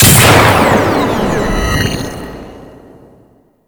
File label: Ship Reactor Node Explode; Fomorian Ship; Space Battles